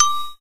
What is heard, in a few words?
xylofon son; melody; gcompris-qt